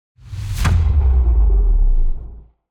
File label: sub sonic bass; sonniss gdc